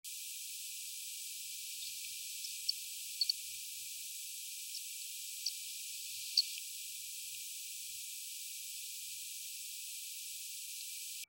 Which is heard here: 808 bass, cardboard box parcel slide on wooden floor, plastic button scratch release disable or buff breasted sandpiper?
buff breasted sandpiper